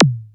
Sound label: 909-Style drum hits; FM 57 - The Ultimate Sample Collection (1997)